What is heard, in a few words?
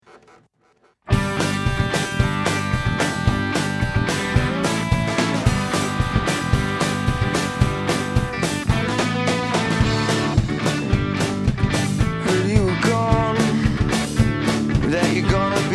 drum test